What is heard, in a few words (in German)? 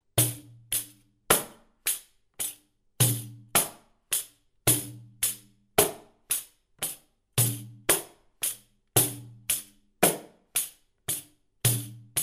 Pop groove gesamt langsam, pandeiro